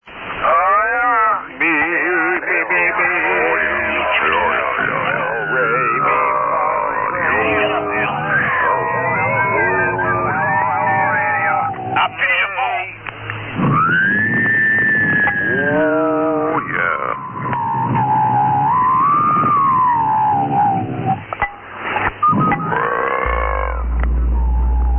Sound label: SSB Net Tune Up